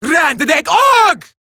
attack, female; Warframe; Eximus Grineer Female; Battle Barks

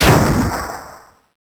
Super Weapon Bomber Explosion; Warframe; Caliber Chicks

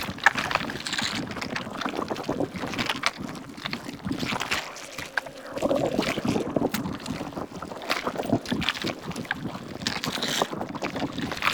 Infested Destructable Cyst Loop; Ambience; Props; Warframe; Infested Eris

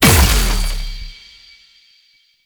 Fusion Pillar Pulse; Warframe; Qorvex

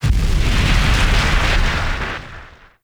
Fire Engulf, Exterior; Warframe; Awakening Remaster; Ambience

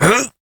Jump Adult Operator; Warframe; New War

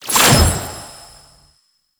Mirror Defense Rune Shot; Warframe; Entrati

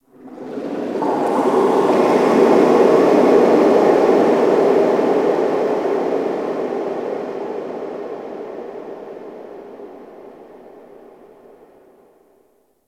Underwater Texture Medium, Ocean; Warframe; Grineer Ocean

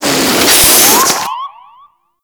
Balloon Inflate; Festivities; Warframe; Follie

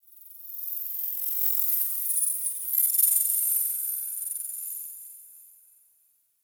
Tech Texture Small; Warframe; Ambience; Cephalon Weave

